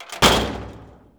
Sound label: Door Slams; doorSounds